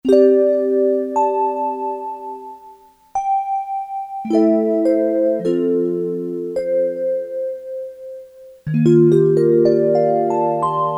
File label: Vibes, Korg DSS-1; KSDU-013 Combined Groups